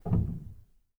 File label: rel, Grand Piano; bitKlavier Grand; Piano Bar